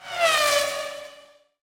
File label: mach dash